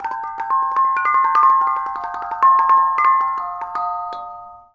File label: KONGWONG; Spectrasonics; Heart of Asia; KONGWONG PH